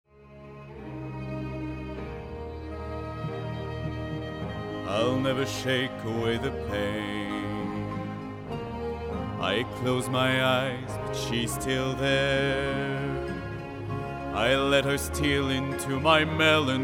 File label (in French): chant adulte grave